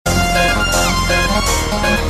New Sms Theme

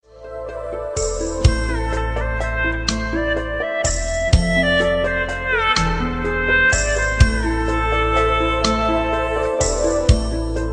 Nice Flute Song

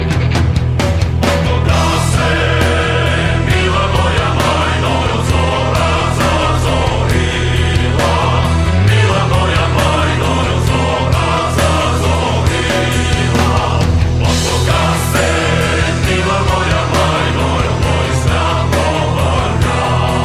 Bulgarian Metal

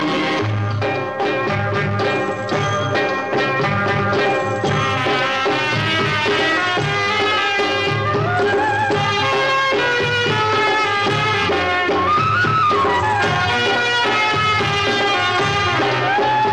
Old Song

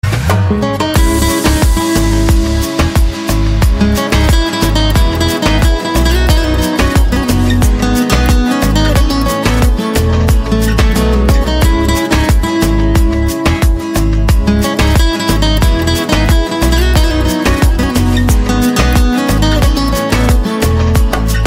passionate guitar

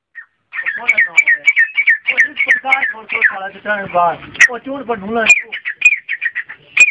Grey Francolin

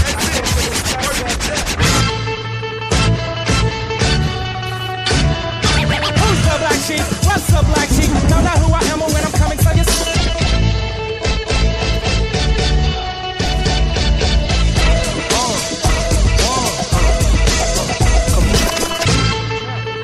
bongo; spider-verse

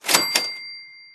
XP Gain; Fallout 4

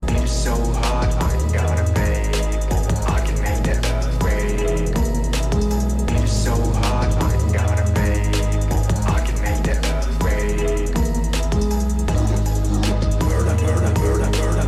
Dance Hip Hop Remix

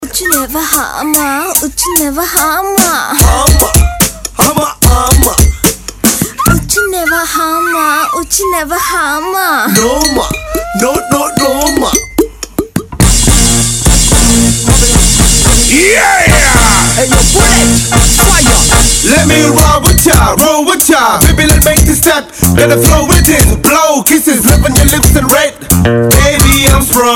Hmmm Tone

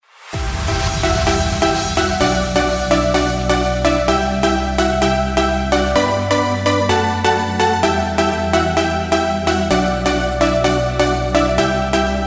Simple Cool Ringtone

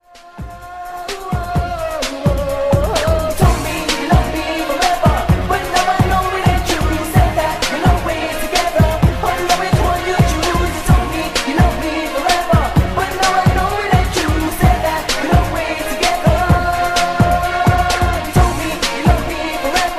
Latin Freestyle

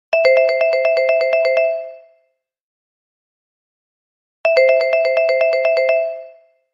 Xylophone

